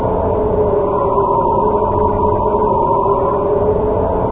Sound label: alien wind; source engine; hl1; video games